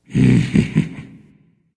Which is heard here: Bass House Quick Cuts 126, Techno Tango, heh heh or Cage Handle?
heh heh